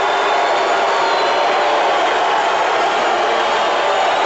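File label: Basketball Crowd Jeer Loop; Crowds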